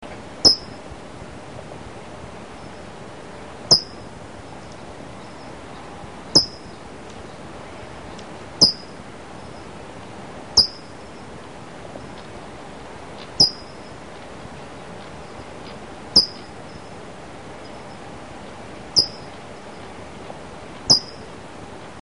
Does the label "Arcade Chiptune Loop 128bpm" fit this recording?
No